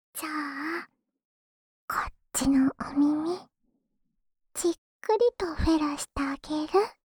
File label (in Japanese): 無垢 ねっとり左耳フェラ, ASMR; フェラ素材